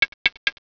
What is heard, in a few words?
knock